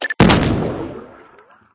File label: Bomb